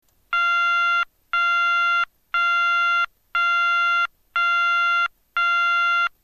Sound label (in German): ton, Signalgeraete